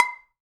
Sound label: Kitchen Foley Dry Drums; Antidote Advent; Kitchen Foley Sounds & Loops